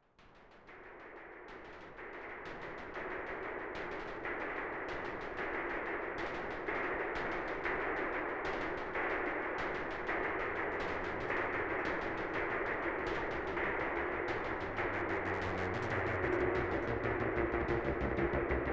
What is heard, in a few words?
Eventide H9 Dark Synthesizer